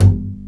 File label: STR Cb Pizzicato; Roland L-CD702; Cb Pizz 3